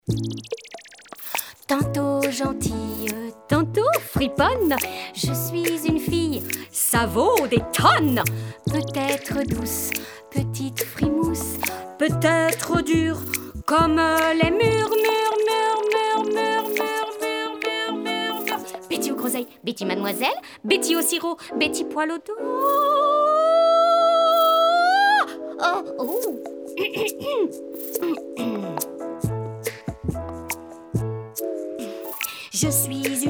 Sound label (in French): CHANSON voix multiples